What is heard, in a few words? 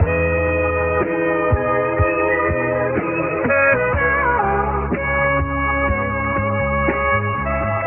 horns and voice, Phonky loops